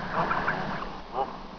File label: All geese together young old